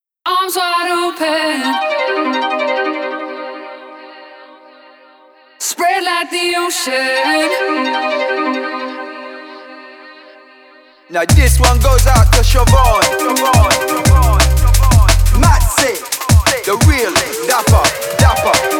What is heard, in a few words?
Drum Bass, 87